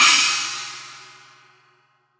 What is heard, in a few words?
China Cymbal; LA Perc; Akai MPC-500